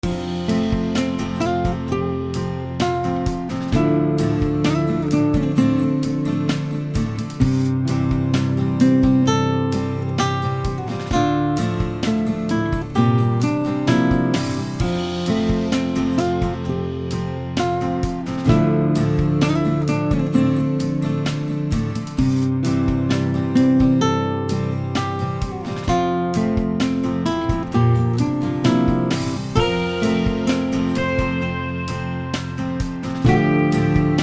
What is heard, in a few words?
sand acoustic